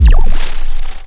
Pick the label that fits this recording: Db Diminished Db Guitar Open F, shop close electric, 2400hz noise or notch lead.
shop close electric